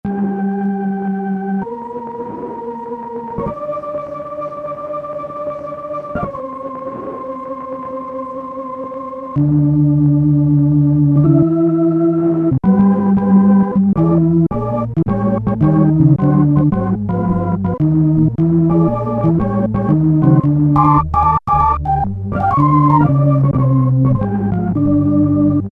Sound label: KEY-Optigan Keys; Keyboard Instruments; Roland L-CDX-02 Keyboard Instruments